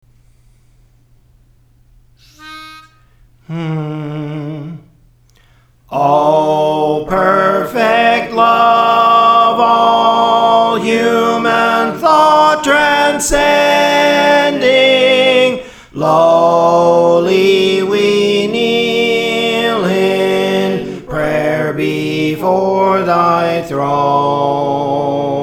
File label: Soprano, Songs; Praise For The Lord Training